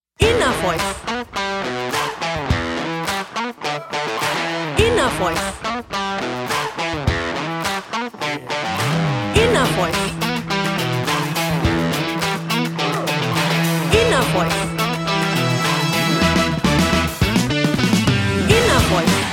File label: 6 strings convo